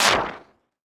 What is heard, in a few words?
Weapon Missile; AdobeCC